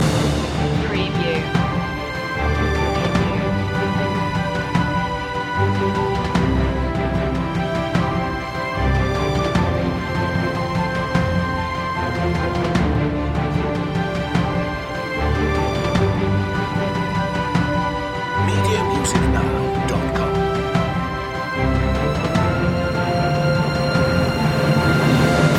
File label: somewhere on this planet loop alt perc; media music now